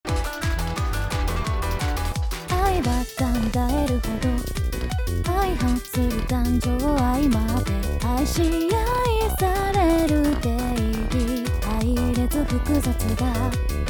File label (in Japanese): Synthesizer V, さらに調整を加えた音源, 歌声; Synthesizer V Studio 2 Pro